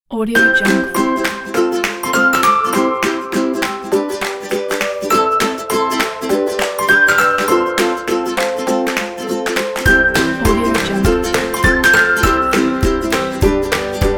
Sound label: funny happy folk